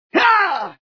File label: "hyaa"